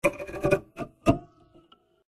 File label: instrument nukulele lead